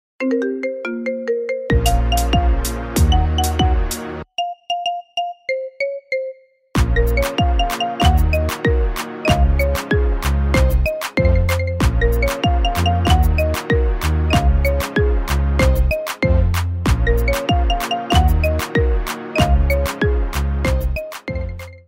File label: Marimba